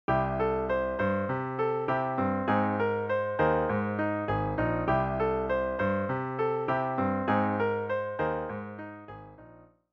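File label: vamp, piano rendition of the chords, C Ab(add9); song intro; 3-Chord Vamps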